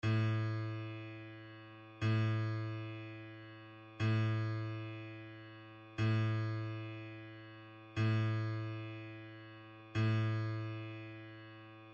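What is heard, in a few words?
a string, guitar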